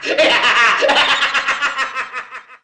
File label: wraith die